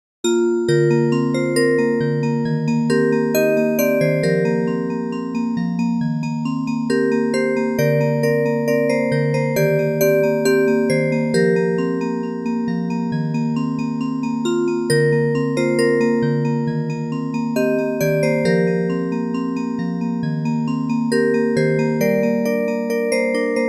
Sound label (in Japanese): オルゴール ver.